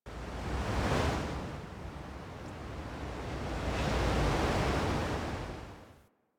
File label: Wind Gust; Warframe; Corpus; Ambience